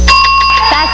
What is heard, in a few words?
bzzz match